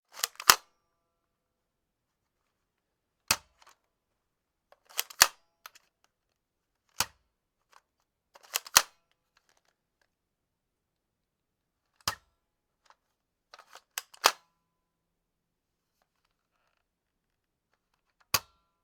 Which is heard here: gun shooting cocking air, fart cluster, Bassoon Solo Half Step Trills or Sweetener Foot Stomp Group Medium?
gun shooting cocking air